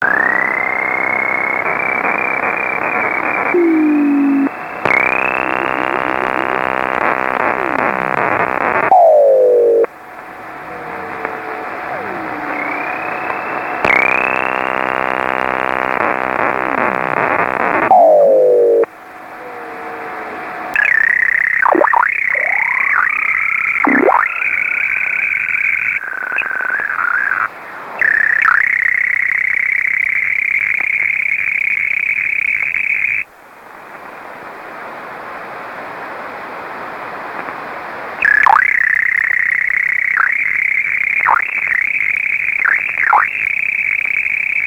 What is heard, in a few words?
Creeping